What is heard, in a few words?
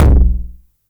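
909 low tom, tape saturation; Goldbaby Tape808 & Tape909; The Tape 909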